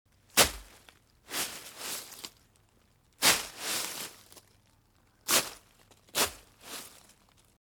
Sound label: Rake few leaves on grass garden